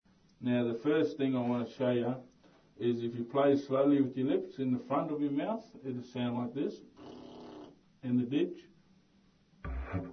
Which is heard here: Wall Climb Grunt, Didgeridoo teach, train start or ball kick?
Didgeridoo teach